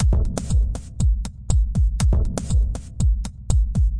industrial deepgate, 120 bpm, drum loops and breaks